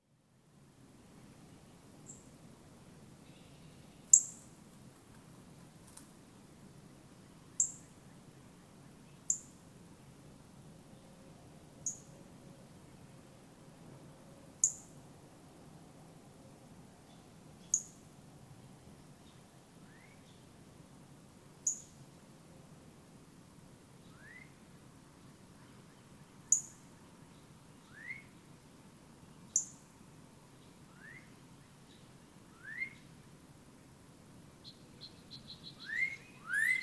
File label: Northern Cardinal